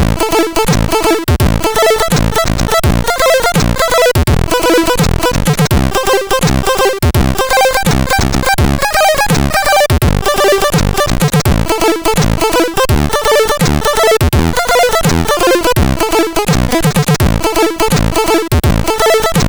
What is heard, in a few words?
Arcade, sid CSG8580R5; Commando